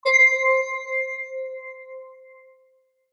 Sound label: Stab Bright, Stab Bright Musical Tonal Bell Octaves; Stabs